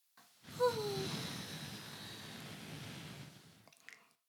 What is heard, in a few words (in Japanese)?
耳舐め音声; 古き城のドラゴニート2